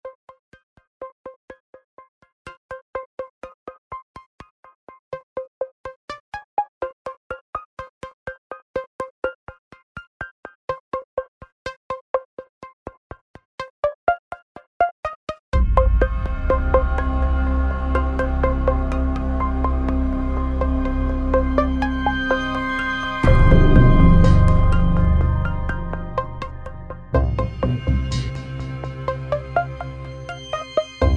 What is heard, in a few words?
Film Cue